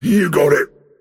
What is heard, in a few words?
move pain; Dota 2; pudge